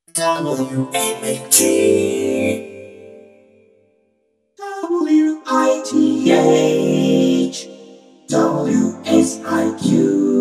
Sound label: radio jingles